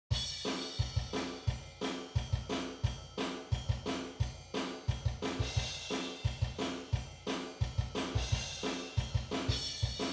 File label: Reverb Only